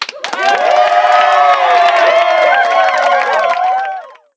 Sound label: cheer